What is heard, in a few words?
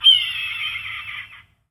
hawk screeching; Mike Koenig